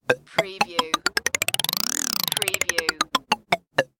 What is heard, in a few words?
Wheel Spin; Sound Effects Bible